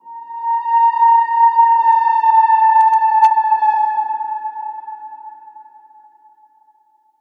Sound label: Stress